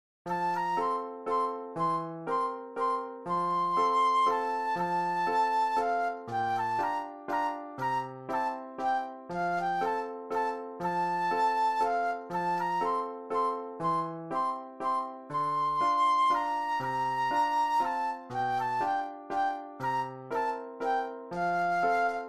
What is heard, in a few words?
Scherzo Flute Solo; classical; Diabelli